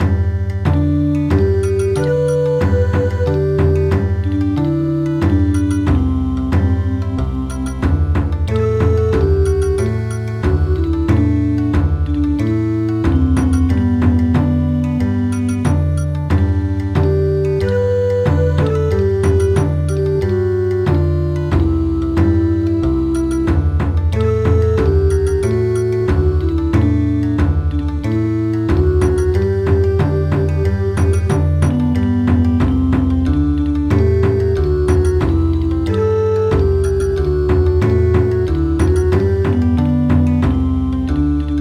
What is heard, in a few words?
Bon Odori